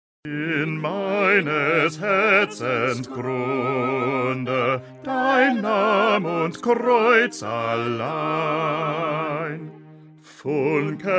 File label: Bass